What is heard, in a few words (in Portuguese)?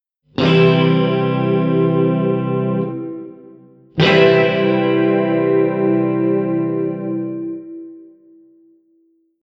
Acorde C + Harmonicos E B G